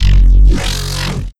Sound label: Sound FX Bass Low Laser